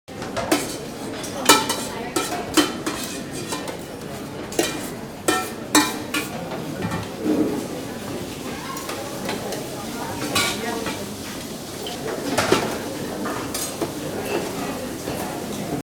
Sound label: cantina, surroundings; best service Blue Box